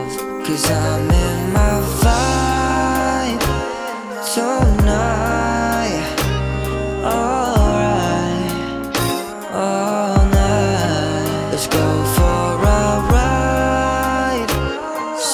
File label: chorus